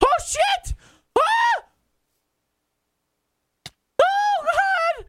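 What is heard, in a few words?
death scream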